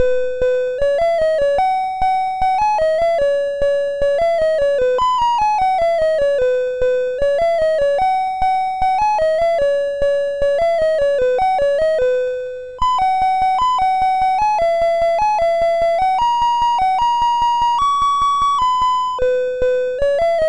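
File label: Mr Wiz, MOS 6581 SID chip music, games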